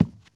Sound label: cardboard, footsteps walk; boomer